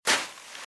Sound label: Footsteps - Sand - Dry - Walk - Right